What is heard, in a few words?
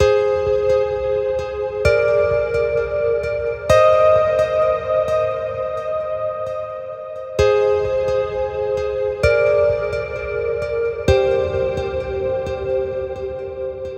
Guitar Pluck - Cm 65Bpm; Construction Kits; STEMS; Ghosthack; SkyRealm; Kit 02 - Solomon Hill